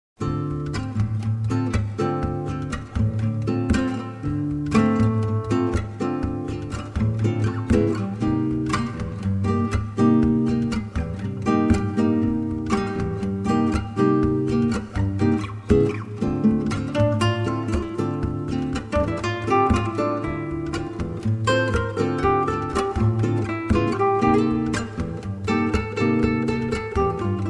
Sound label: Acoustic